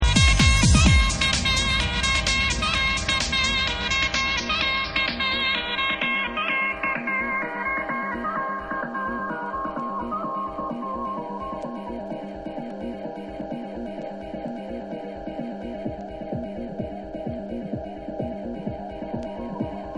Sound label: Uplifting Sax Mix